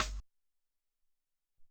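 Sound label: Hop Snare, Alesis SR-18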